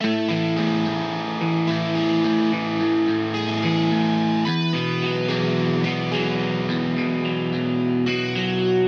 Arpeggio

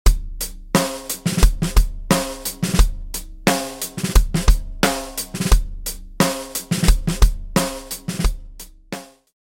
snare Tama Warlord with Sticks; BFD拡張音源; fxpansion; snare selects

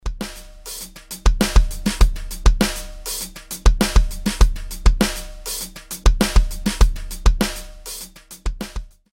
snare with Brushes; GMS; BFD拡張音源; fxpansion; snare selects

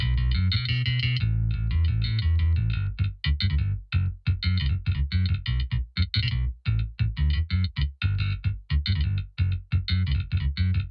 Bass Amp After; 2264ALB to 1073LBEQ; neve

